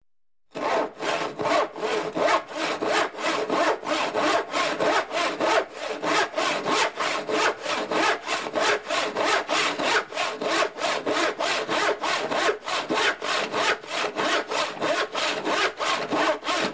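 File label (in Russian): пила ручная средний темп доска ломается в конце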